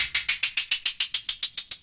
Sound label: fx (hats); drumkits; fl studio; xander brown drumkit